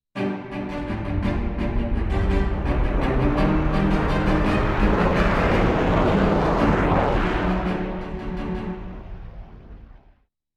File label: Cello Theme with Flyby; Zyxx; Season 1